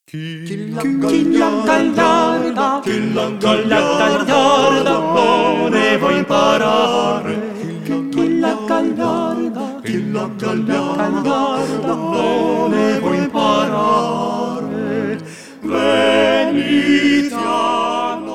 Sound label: Voice Quartet, Gagliarda